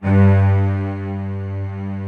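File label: string, orchestral strings; Greytsounds VOL-1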